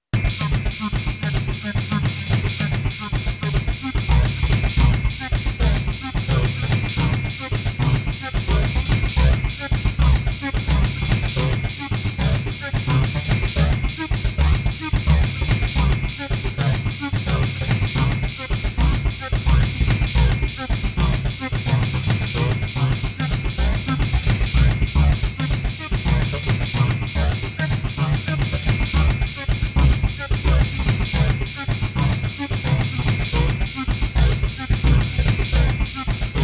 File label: bassy baby in G